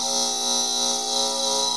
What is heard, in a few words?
charge engine loop; hl2; combot